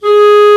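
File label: Clarinet mf G#3; Multisamples; Digital Sound Factory Virtuoso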